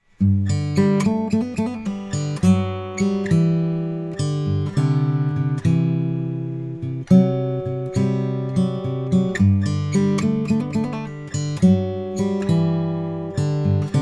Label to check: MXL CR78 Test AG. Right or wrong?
Right